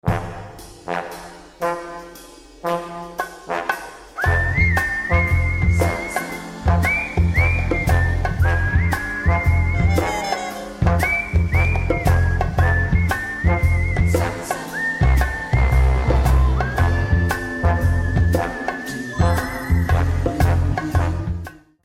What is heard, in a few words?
Whistling; Michelin